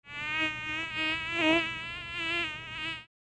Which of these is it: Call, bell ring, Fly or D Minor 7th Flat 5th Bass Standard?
Fly